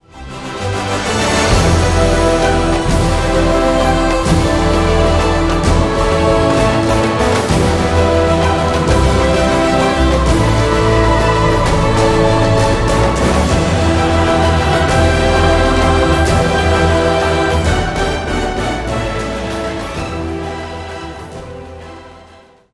Adventure Fantasy Epic Battle

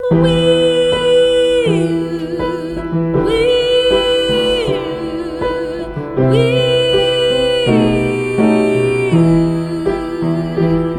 Descending 5th slide

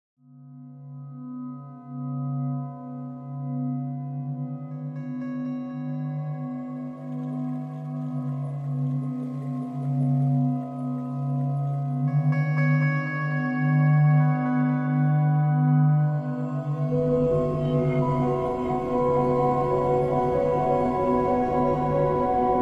ambient wistful

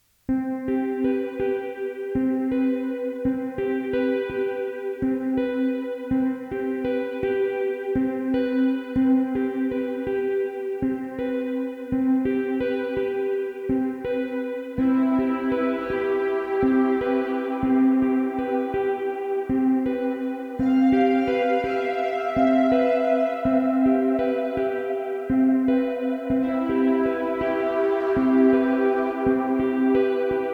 Slow Dark Heartbeat 75

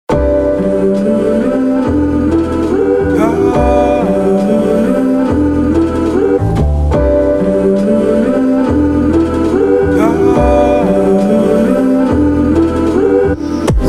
Piano

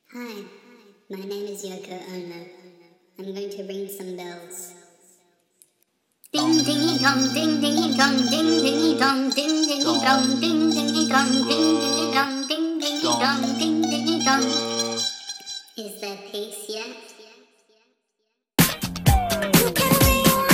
Bells

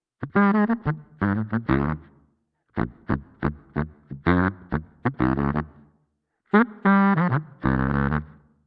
Reproduction of Articulation Pitchfactor; Saxophone; Eventide Pitchfactor

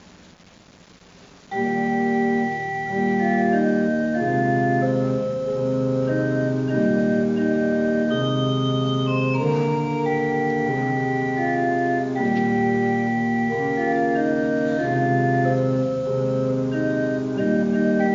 Organ Deep River

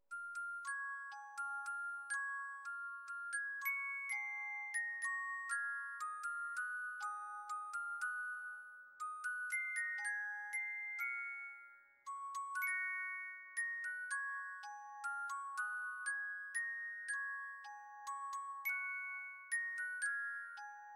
Lullaby

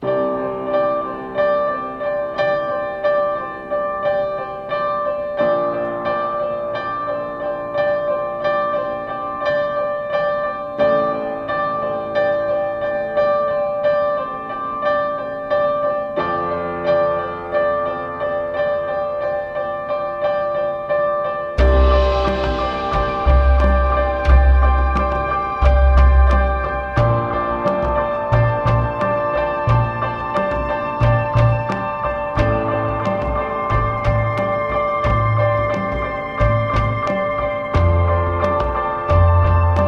Instrumental

